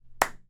one clap

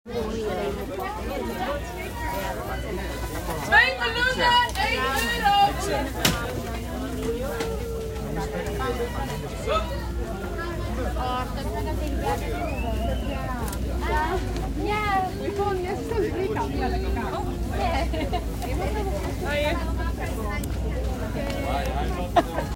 market, Rotterdam; Rotterdam Field Recordings

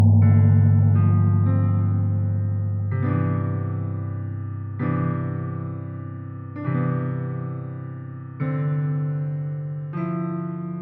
piano exp

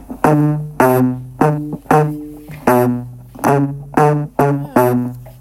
banjo

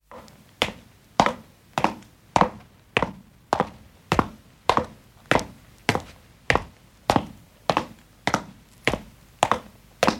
footsteps

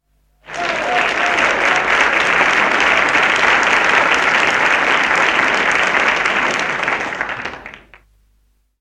applause

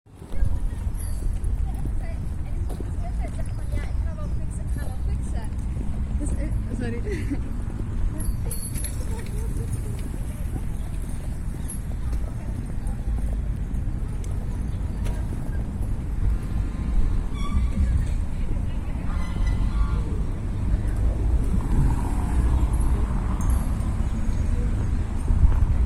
Rotterdam noord, Field Recordings

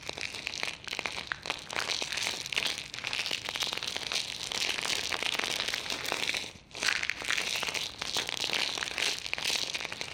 Tub Rattle

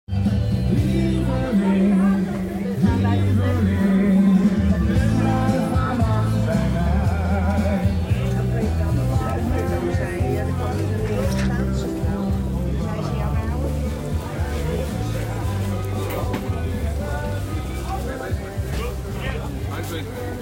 Radio market, Rotterdam Field Recordings

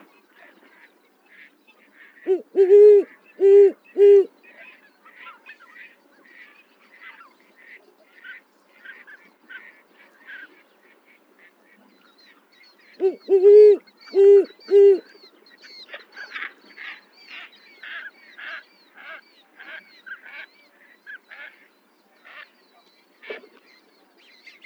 owl

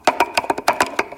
Wooden box

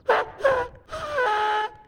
Harmonica

